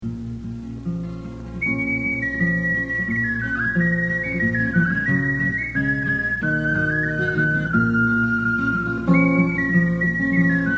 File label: Andean tune